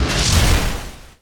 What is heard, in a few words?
meld attack; templar assassin